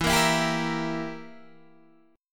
E Diminished E

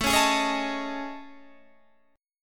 A Major Sharp 11th, Guitar DADGAD

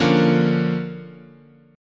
D Minor Major 7th Sharp 5th, chord

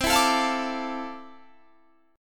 F Major 13th C, guitar chord

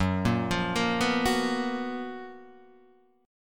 F sharp Major 11th; F#M11 Chord {2 1 3 3 0 2} arpeggiated

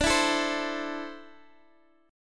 D 7th Suspended 2nd down Guitar DADGAD

